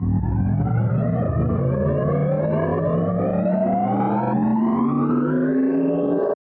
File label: Hell Fire